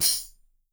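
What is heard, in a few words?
tambourine, right; Miroslav Vitous - Percussion & Harp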